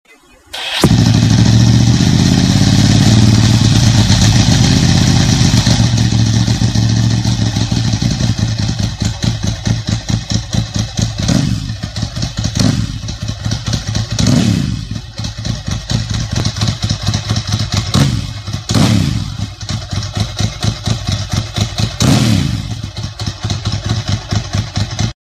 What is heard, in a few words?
scorpion, Ducati